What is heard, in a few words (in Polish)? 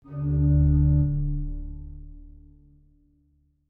organy D1